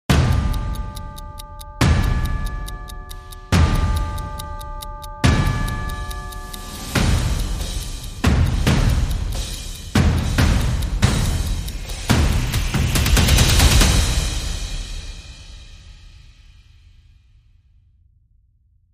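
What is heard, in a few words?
Dramatic Sting, Percussion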